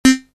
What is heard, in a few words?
Triton, synth lead